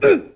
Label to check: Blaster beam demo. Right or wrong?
Wrong